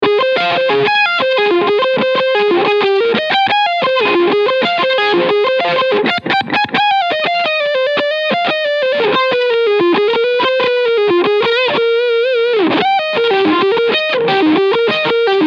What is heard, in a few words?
Mid Frq Mid full Drive Push full tone; JHS PG-14